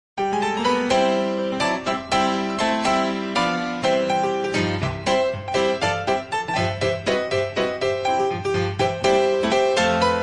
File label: Hallelujah, I'm Going Home, Old Time Gospel Piano